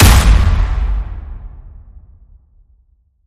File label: Crowd Clap Smack Hit